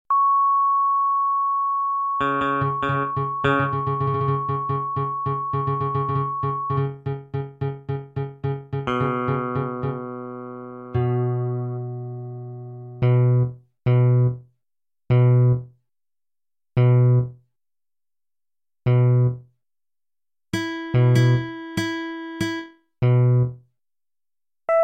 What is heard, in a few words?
Glass Tetrahedron; MidiM